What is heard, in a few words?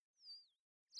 sting bird chirp; Rust